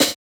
Snare, JUNGLE BREAKS

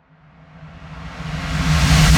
Reverse

